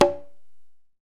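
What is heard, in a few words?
perc quinto, deep congas; Roland LCDP14 Africa VOL-2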